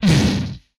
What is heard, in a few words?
Explosion, retro arcade; Explosions; MMRetroArcadeSoundsPack